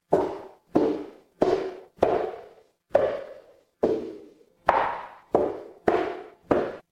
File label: footsteps stairs